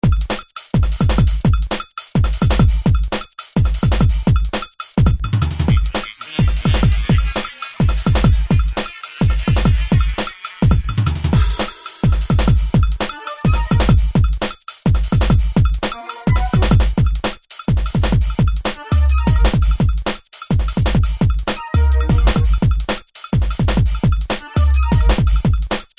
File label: Flute Boy Remix; It was created using GarageBand, Logic, and ProTools 7